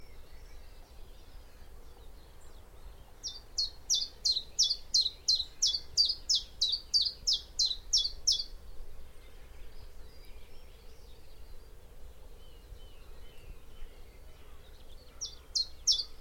Common Chiffchaff Phylloscopus collybita collybita